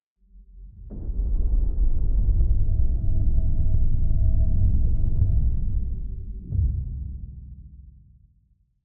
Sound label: bass